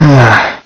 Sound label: sigh; neku